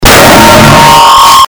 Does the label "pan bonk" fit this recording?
Yes